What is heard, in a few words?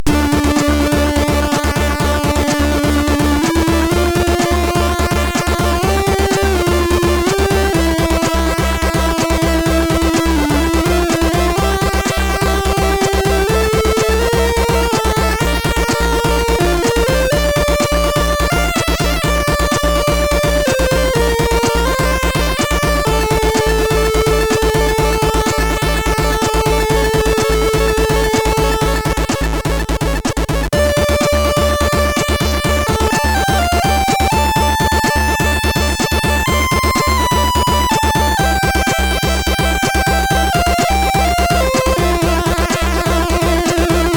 Parallax Percs, CSG8580R5; hvsc